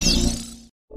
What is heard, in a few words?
glitch; AC animus